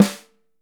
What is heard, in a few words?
5X14, Drums